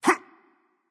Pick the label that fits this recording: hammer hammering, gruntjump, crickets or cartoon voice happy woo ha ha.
gruntjump